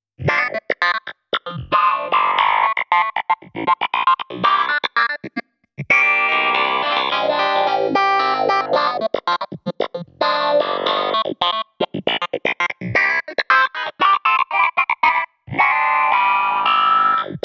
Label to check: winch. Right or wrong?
Wrong